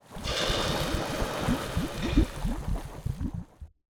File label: pour metal; VintageStory; survival